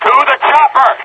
chopper, soldier; Left 4 Dead 2; Source Engine; video games; npc

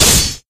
katana impact object; tf; samurai

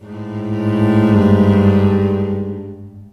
smoker bacterias, music; Left 4 Dead 2; Source Engine

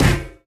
physics turret impact sm

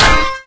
guitar hit world; weapons; source engine; left4dead2

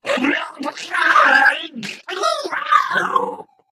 ballbot crushed scream

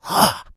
become alert, infected

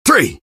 taunt rps exert; engy; tf; taunts

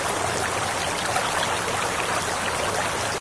water run; ambient; Source Engine; cstrike

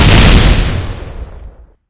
rocket direct hit explode; tf

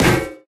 physics turret impact md

